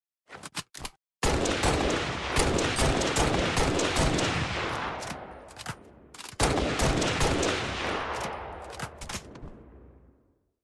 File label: DMR sound effect